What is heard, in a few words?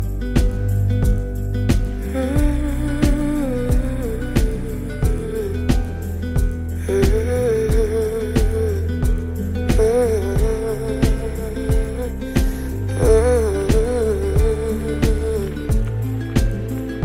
hindi music ringtone, bollywood ringtones